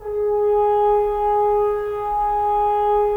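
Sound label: Solo French Horn, Brass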